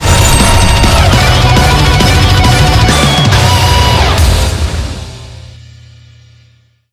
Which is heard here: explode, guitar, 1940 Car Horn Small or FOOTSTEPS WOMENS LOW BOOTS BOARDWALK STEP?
guitar